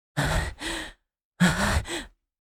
Heavy breathing anime boy vocalization

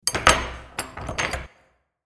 Large cottage door lock; locks keys

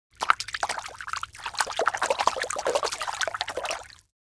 Fishing fish water movement fast splash

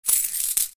Handling coins quarters

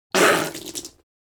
Man spitting spit water

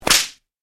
Whip crack impact

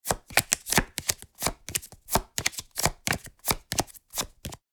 Flipping through cards board game

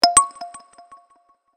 Gentle delayed bell alert

